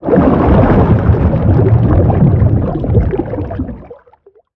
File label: creature shadow leviathan swim patrol